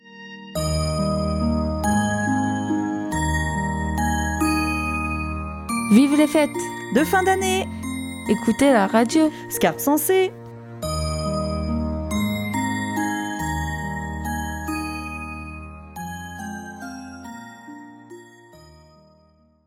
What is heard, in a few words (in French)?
JINGLE Vive les fetes